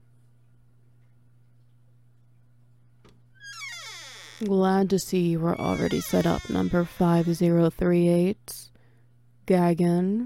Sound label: Wet Noises